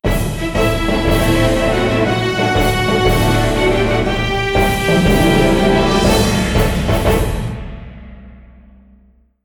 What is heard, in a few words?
MUSIC VICTORY